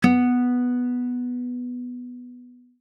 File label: dial tone guitar; OnePlus One China